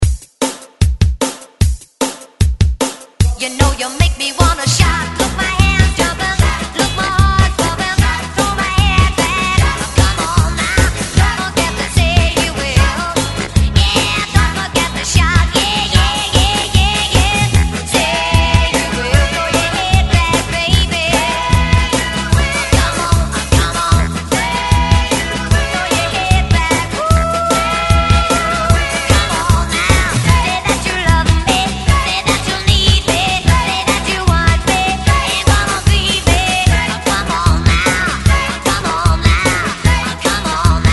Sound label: ReDrum 149 Bpm